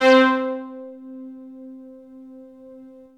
Techno leads, hits and riffs

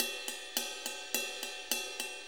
4-4 Ride Loop; Drum Tools; 4-4 Drum Loops; HiHat & Ride Loops

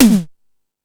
Fusion Stix, Drum Machines

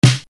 LINN SNR, Linn 9000

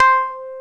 SMA, synthesizer bass; VFX-SD

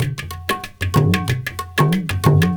INDIA L; Spectrasonics - Heart of Asia CD2

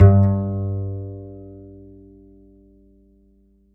Upright Acoustic Bass, 1ST POSITION; Larry Seyer

